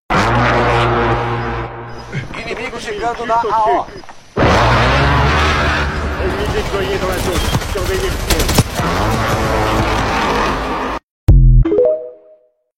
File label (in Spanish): avion warzone